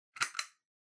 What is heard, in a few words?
Pendant Light Pull